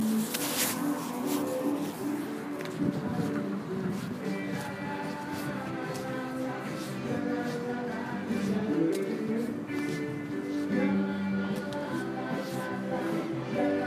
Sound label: oriental bar glasgow; hutcheson street